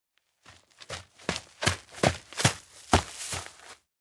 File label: steps outdoor ranch run up; makoto; anemoi